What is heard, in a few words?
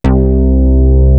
SUN BASS, KORG; WS KORG; East West; Global Sounds